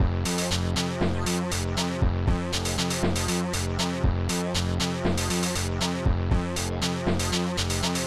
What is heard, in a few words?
synth jam; OctaMED SoundStudio V1; mug-members